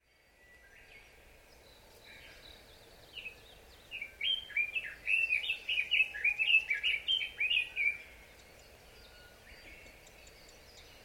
red billed leiothrix

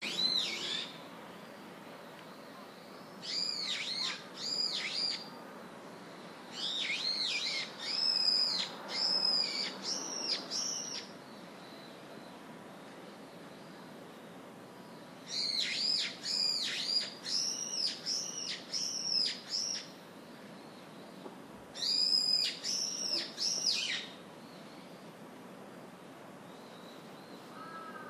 brown eared bulbul

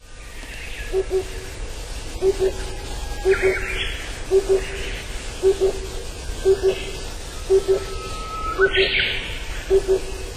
oriental cuckoo

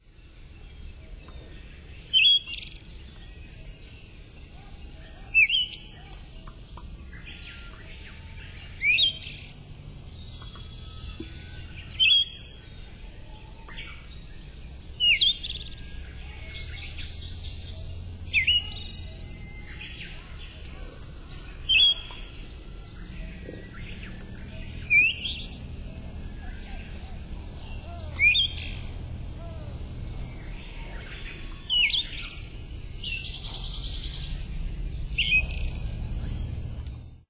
siberian thrush

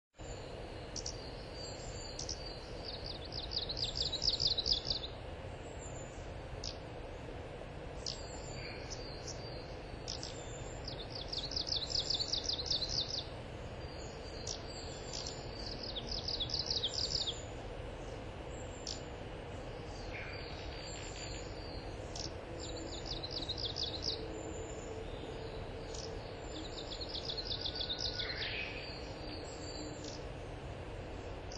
arctic warbler

